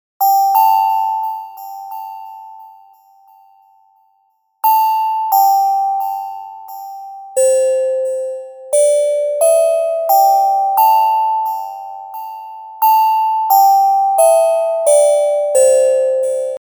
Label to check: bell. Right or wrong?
Right